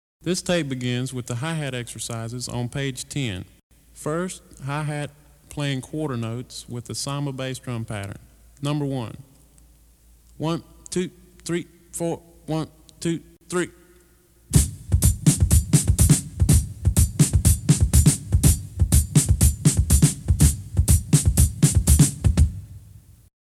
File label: Hi-hat Quarter Notes